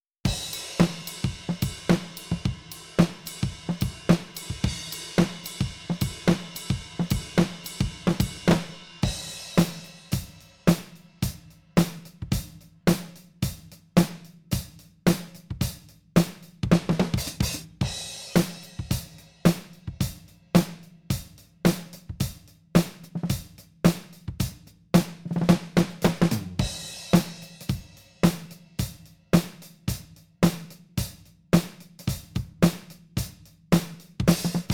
modern drums

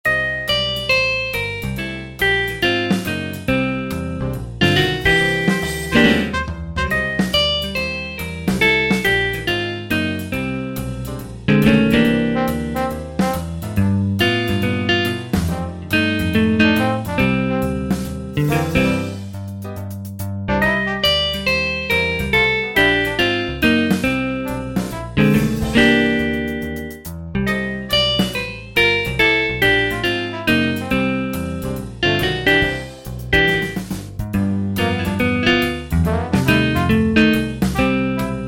electric guitar clean shimm

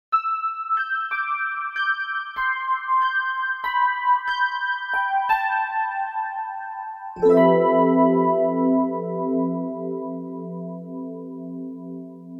the duet high key

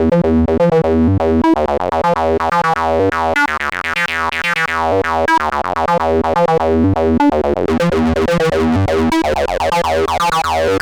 corrosion on analog bassline

